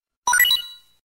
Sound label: Item Drop; Metal Gear